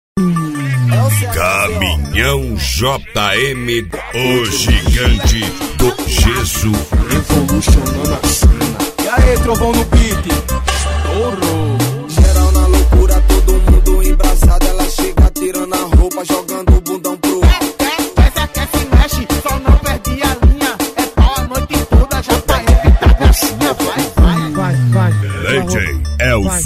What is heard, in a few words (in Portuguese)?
remix brega funk; cd caminhao jm o gigante do gesso